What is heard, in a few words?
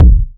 natural kick drum one shot F sharp key, Royality free kickdrum tuned to the F# note